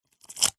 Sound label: hospital syringe suck in thick gel like liquid